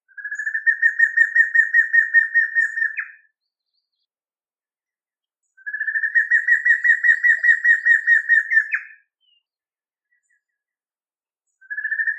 Canto do matracao

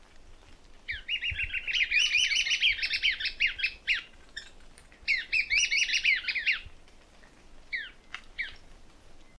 Canto do caneleirinho de chapeu preto

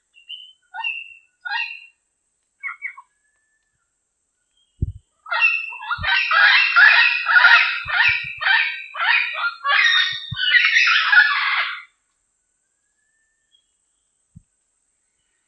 Canto do Papagaio Diadema

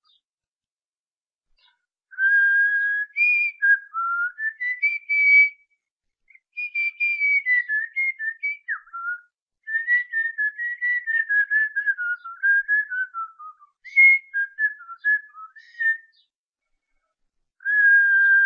Assobio para Calopsita

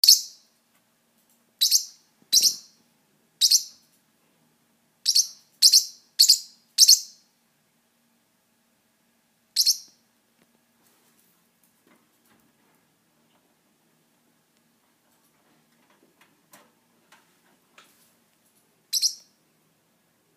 Canto do Forpus

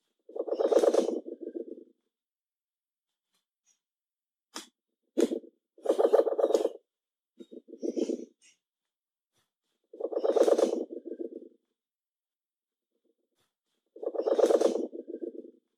Canto da Murucututu de barriga amarela

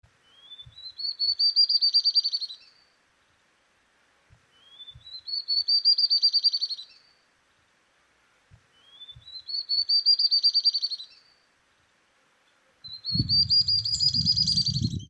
Canto da Garrincha chorona

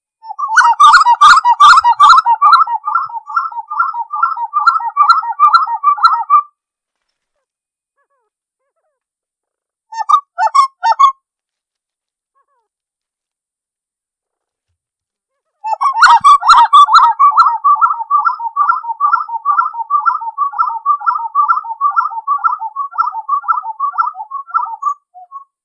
Canto do Uru

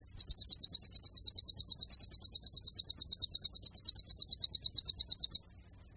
Canto Canario da Terra Metralha 1 Nota

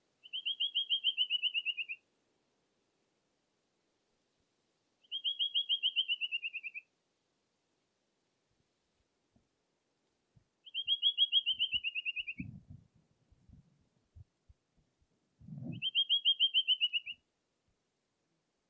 Canto da choquinha de asa ferrugem

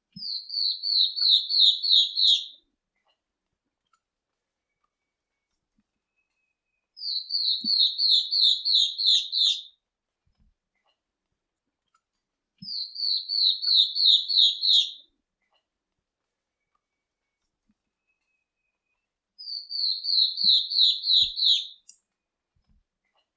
Canto da Choquinha de garganta pintada

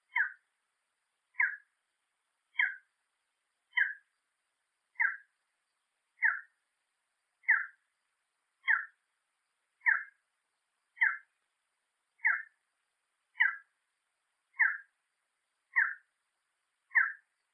Canto do falcao cabure

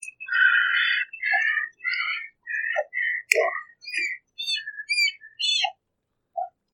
Canto da Garca Azul

